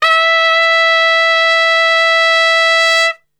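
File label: E 3 piccolo trumpet, Brass; Best Service; Brass Super Section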